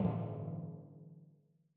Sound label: Footsteps On Eater Land; Forest SFX